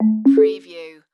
Multimedia Alert

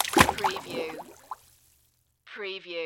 Splash Object Submerge

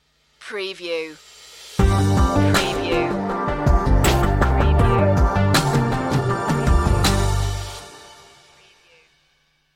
quietly confident stinger

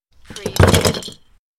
Box of Christmas decorations being moved; beanstalk audio

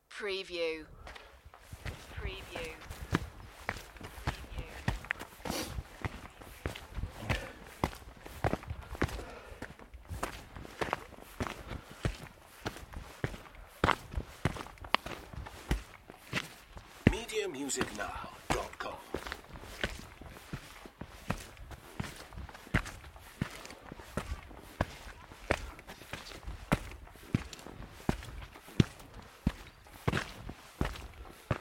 Hiking footsteps and breathing; beanstalk audio

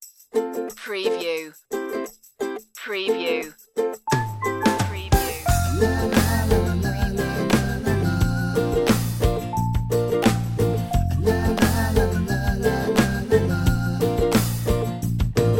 ukulele commercial sunshine island

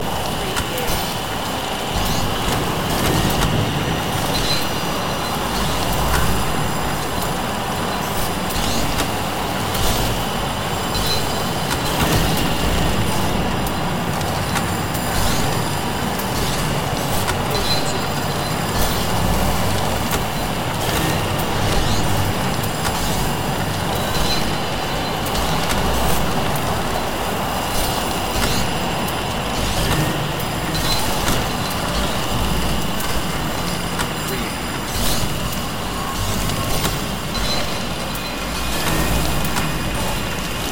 black hole turbulences loop

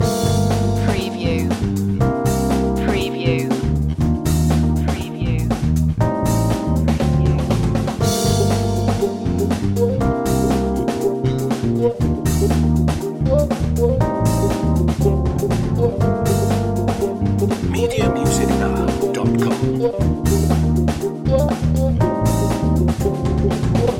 funky moves loop

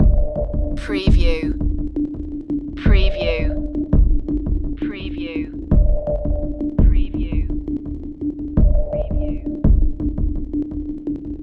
dark synth loop

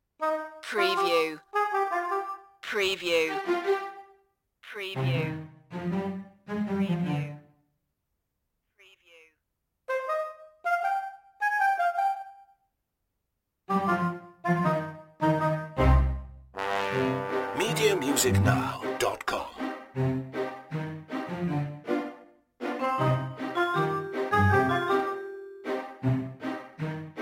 no percussion

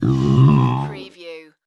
creature vocal

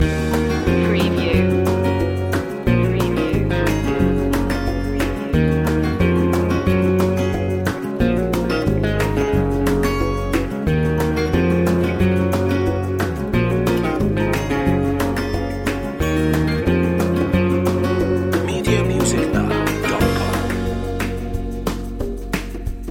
country road trip loop

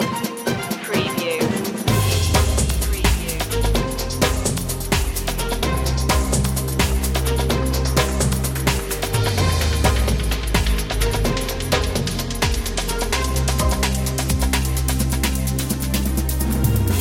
liquid breaks stinger

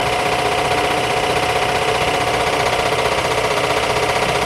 Air Compressor Loop

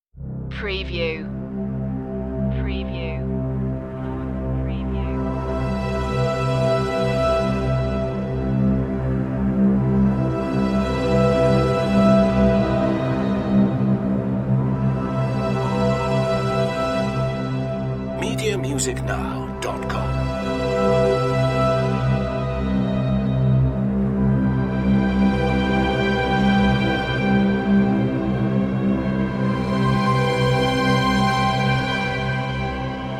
strings in a dark place

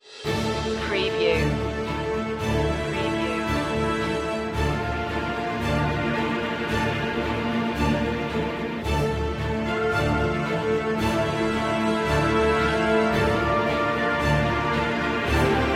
no choir no perc

